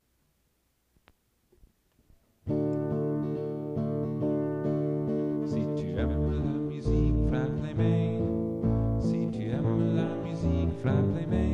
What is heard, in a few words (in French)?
si tu aimes la musique song